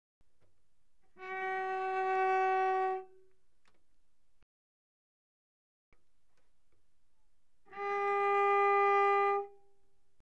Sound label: Cello arco pp sul G Gb4G4; Strings